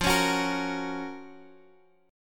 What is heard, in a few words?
F sharp Major 7th